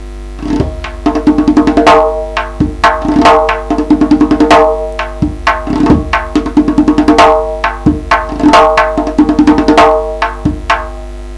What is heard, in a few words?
12 beat rhythm using chakkoshi